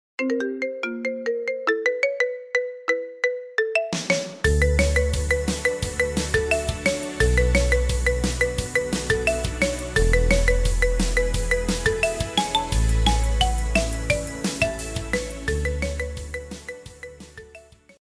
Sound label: marimba remix, marimba ringtone